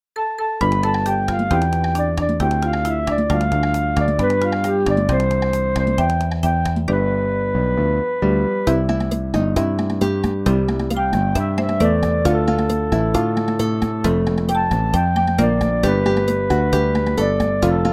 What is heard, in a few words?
international